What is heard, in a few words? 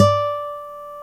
GTR NYL3 D, Nylon Chorus; Roland LCDP02 Guitar and Bass; Nylon String